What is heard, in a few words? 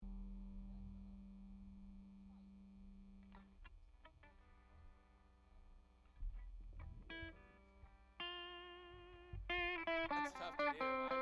Strings Mix